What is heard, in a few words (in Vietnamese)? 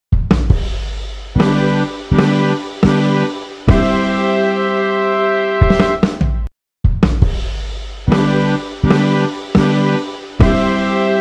nhac mo dau quan cafe vui nhon